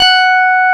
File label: Korg CX3, F#5